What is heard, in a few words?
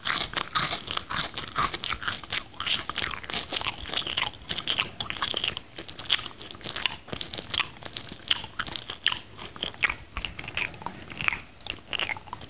Messy Eating Of Crunchy Food